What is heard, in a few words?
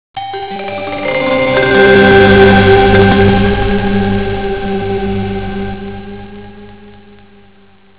drone